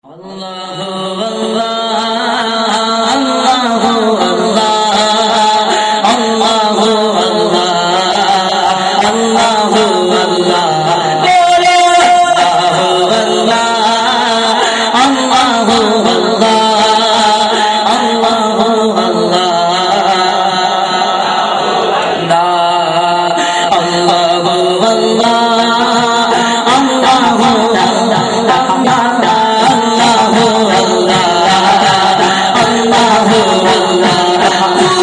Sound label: arabi naat; tahir qadri; islam